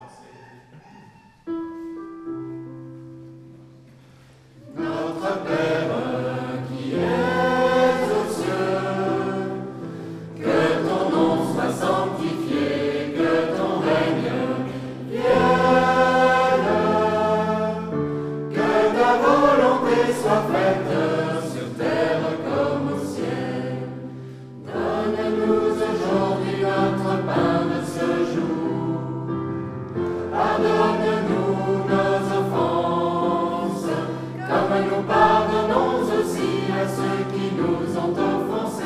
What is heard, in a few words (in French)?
Schola Notre Pere